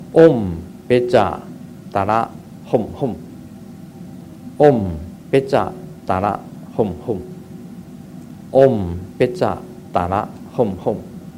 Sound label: Adharma Buddha Mantra